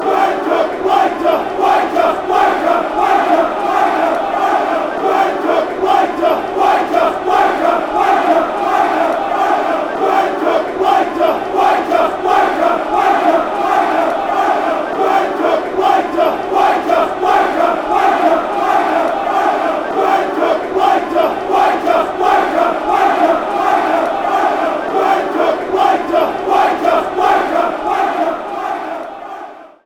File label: wnker, fan chants; watford